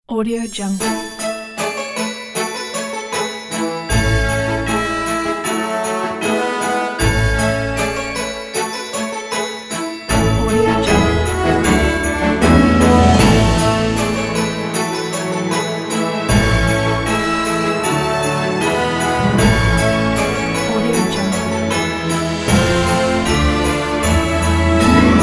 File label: sleigh bells kit